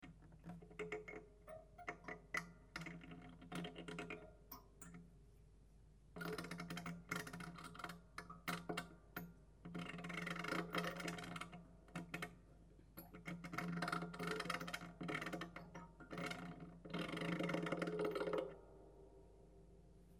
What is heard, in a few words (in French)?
peigne, harpe